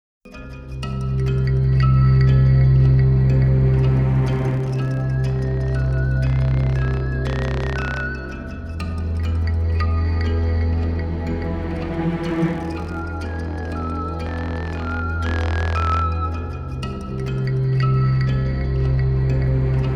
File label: Murmur; Shin Megami Tensei IV